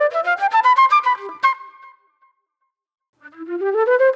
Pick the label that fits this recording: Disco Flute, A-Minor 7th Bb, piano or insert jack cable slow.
Disco Flute